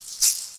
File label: Maracas One Shot Gentle